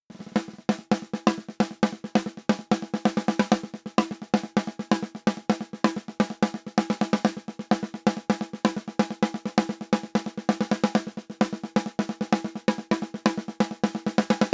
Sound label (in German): SD, 50 Prozent Gain; API 512v